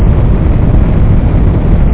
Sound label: Liftoff!; VibraGloII